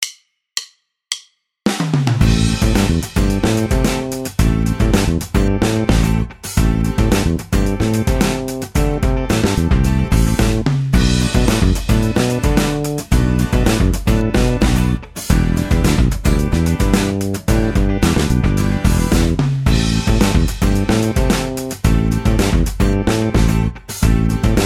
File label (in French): octaves exercice, basse